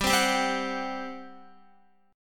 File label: G Major 7th Suspended 4th G down Guitar; GM7sus4 strummed; 4E,4A,5D,5G,5C,6F